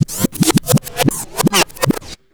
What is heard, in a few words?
Vinyl; Sound FX